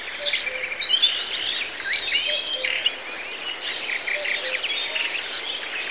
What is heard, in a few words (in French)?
oiseaux